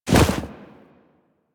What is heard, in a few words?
Walk Deep Snow, Footsteps; Warframe; Operator